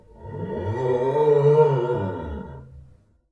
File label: String Rub, piano; Piano Miscellaneous